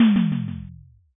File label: impact text